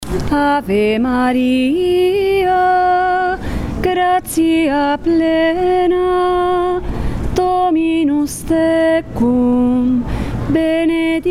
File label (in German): ave maria gesamt